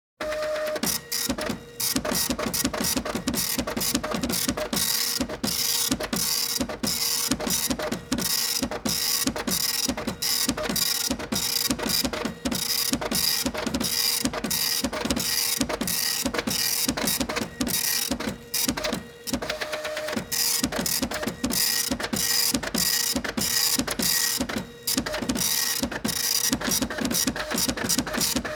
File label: Double-sided matrix printer; افکت صوتی